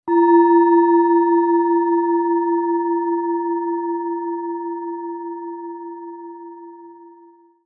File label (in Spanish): Cuenco tibetano original Nota MI; Relajacion meditacion